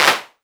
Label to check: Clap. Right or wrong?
Right